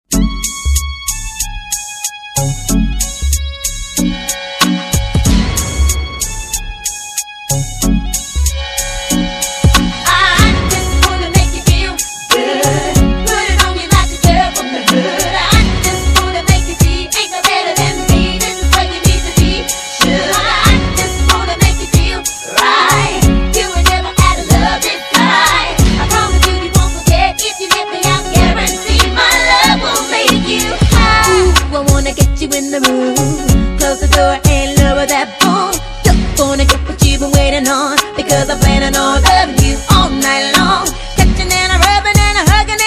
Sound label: pop after eq